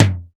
high linn electronic tom sample g key, linn synth tom sample tuned to the G note; tom drum samples